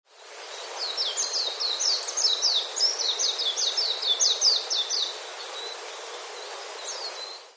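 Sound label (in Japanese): mejiro, Japanese white-eye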